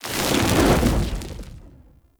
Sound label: Summon Fire; Warframe; Uriel; Demonium